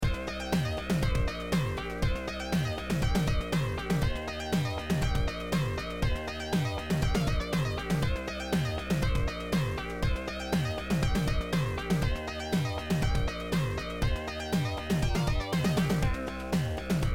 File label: bass basstone lead sound beat